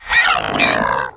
attack; mahlendur

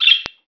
bird

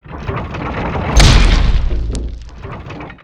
stomper boom

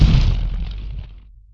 step boom

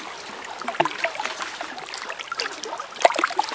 lakeshore stone

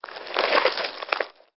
slide; mahlendur

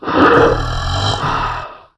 DIE

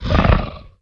AMBIENT; mahlendur

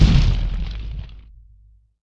step boom

